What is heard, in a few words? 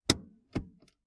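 embrake release, brakes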